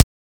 Weapon Punch SMG; Warframe; Weapons; Weapon Punch Layers

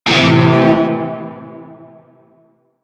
Shawzin Maj Chord; Warframe; Narmer Shawzin

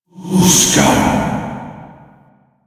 Whispers; Warframe; Tenno; Grimoire

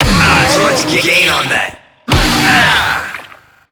Infested Lich Pain, Barks; Warframe

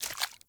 Walk Water, Footsteps; Enemy; Mockeries; Mockery

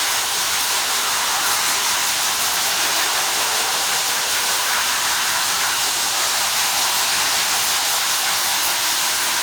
Infested Mist Loop A, Ambience; Warframe; Infested Eris; Encounters

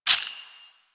Charge Full; Warframe; Weapons; Infested; Inf Cernos; Prime Derelict Cernos

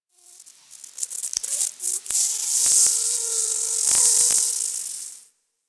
Forest Close Insect Buzz Close; Warframe; Grineer Forest

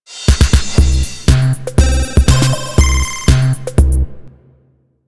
Fishing Success Eight Bit, Music; Venus; Landscape

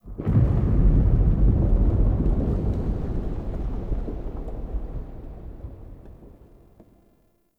Room Enter; Puzzles; Circade

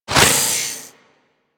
Worker Grinder Whoosh, weapons; Grn Worker Grinder

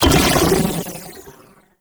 Handler Spawn Burst; Warframe; Catbrow Handler

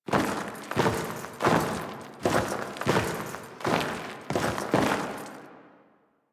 Skiff Crash Foley Steps; Kahl; Warframe; The New War

